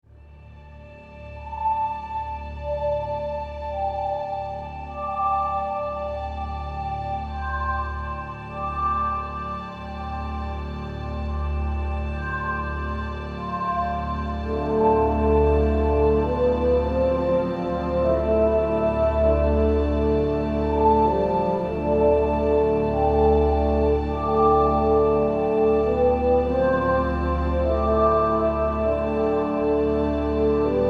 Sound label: ambiance; procyon